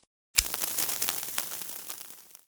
grenade fire delay

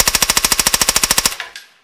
silencer burst

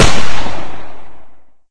loop, 10x25mm; Caliber

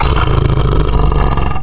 BLOODCAT GROWL

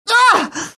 hit; BattleSNDS

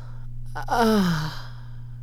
F DYING

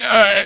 hit; BattleSNDS

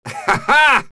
LAUGH; BattleSNDS

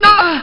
hit; BattleSNDS

